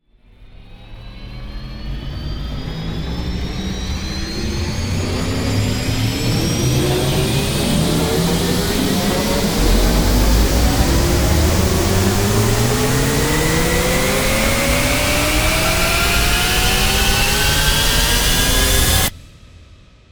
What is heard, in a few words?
Rise And Fall Elevator